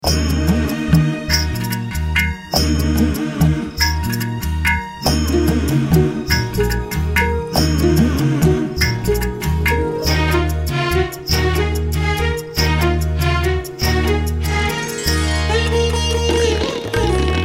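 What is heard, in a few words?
instrumental, ringtone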